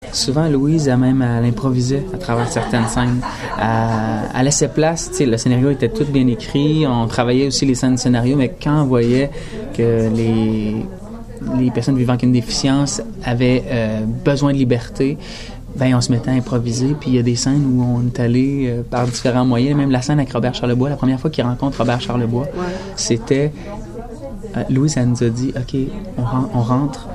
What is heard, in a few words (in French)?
plateau chorale